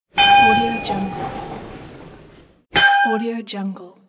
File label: boxing fight bell